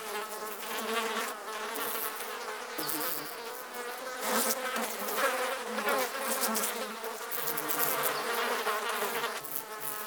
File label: Ambient Fly Loop, Village Exterior; Warframe; Eidolon Plains; Ostron Village; Ambience